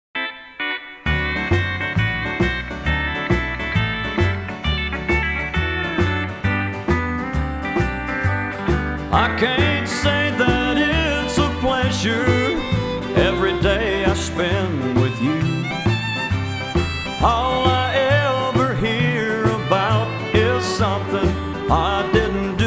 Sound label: toms